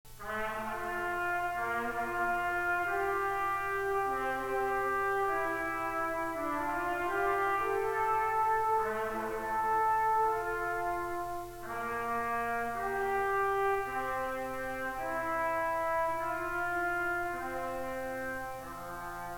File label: Cello Suite IV Bourree II